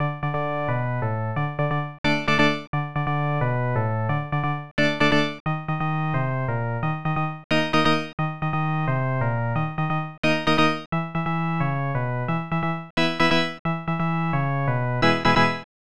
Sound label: Stadium Organ; ESPN National Hockey Night; snes